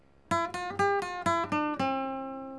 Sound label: PLUCKED